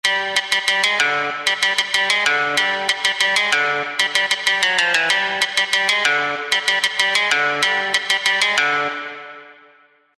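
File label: zvuk gitary, guitar sound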